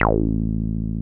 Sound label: 303 A2 f; Drums In Your FACE!